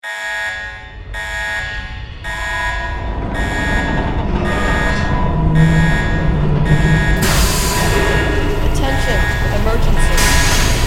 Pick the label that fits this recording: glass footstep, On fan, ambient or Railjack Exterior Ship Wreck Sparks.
ambient